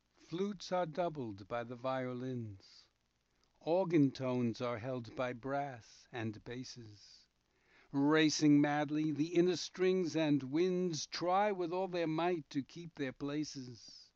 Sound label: flutes